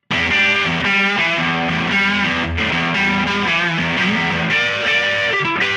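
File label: Distortion; Woodstock Plus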